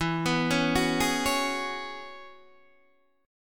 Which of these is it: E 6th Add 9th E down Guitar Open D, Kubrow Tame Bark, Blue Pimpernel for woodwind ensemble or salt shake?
E 6th Add 9th E down Guitar Open D